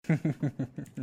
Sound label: laughing